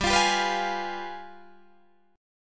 G 7th Flat 9th, Guitar, Open D

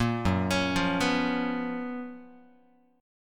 F sharp Major 11th Guitar; F#M11 Chord; arpeggiated; 1,2,4,3,3,x

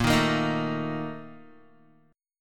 Bb Major 7th Suspended 4th, Guitar; BbM7sus4 strummed

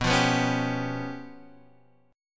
Db 7th Flat 5th

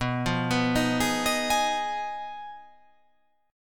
B 6th Flat 5th B down, chord

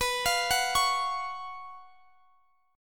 F sharp Major 7th Suspended 4th, B, chord

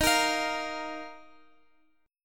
D sharp Suspended 2nd, Guitar, Open G; chord shape

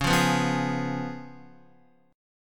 C sharp 7th C sharp, Guitar; C#7; strummed; JGuitar

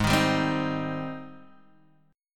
C Minor Ab; Cm/Ab strummed; JGuitar

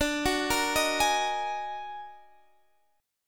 Eb Minor Major 11th

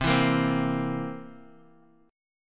C 7th C down, C7; strummed; JGuitar

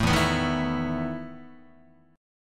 E Major 7th Suspended 4th E down Guitar

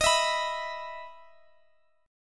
E 5th Eb; E5/Eb strummed; JGuitar

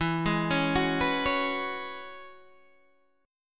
E 6th Add 9th E down Guitar Open G

